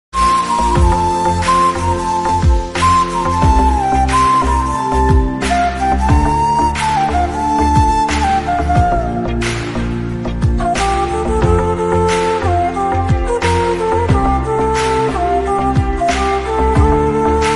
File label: flute